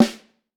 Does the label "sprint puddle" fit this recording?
No